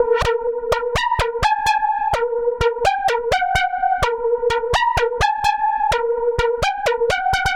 Portamental, Underground UK House & Electro, Synths; Sonic Foundry (Sony Creative Software)